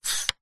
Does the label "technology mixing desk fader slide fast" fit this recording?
Yes